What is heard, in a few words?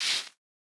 cabasa; eddyflux